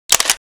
camera click; Optimus 2X SU660

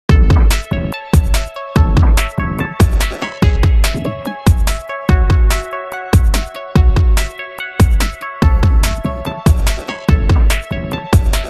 ring, crunchy; HTC S740

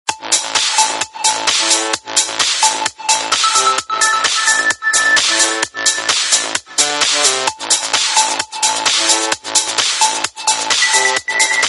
Ringtone, Samsung GT-C3510

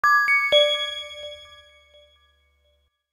Arctic alarm; Nokia N79; Audio themes

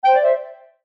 Reed Notification, Clarinet; HTC

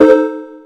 electric, keytone

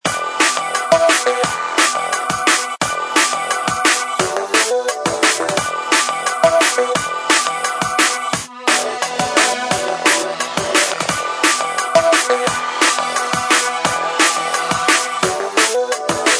Drum & Bass, Ringtone; HTC Freestyle

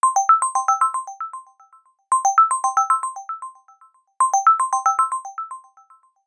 Technology, ringtones; China Telecom

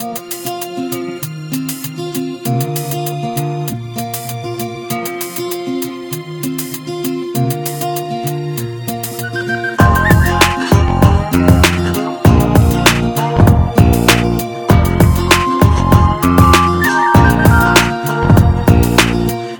music chill out, ringtones; Sony Ericsson; A8i; cmcc

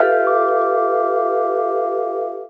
alerttone chimes; The Light Phone II

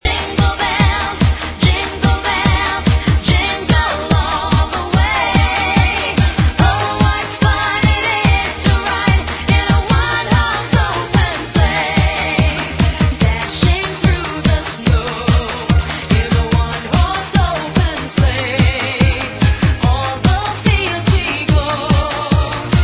Jingle Bell True Tone; Sagem; MY-V-75-CD; Melody; Melody-Sample

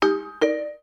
Buzz, notifications; Samsung Galaxy Note 8

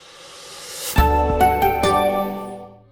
boot sound; Huawei Y320 U10

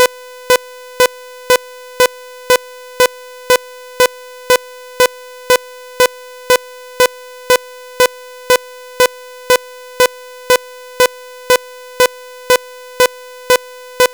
metronome; miniBAE source code audio

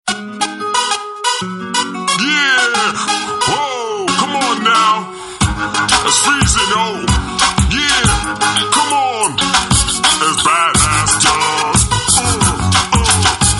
Hip hop Guy; Samsung; SGH-F400

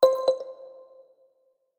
Marble, notifications; Samsung Galaxy J7 Prime

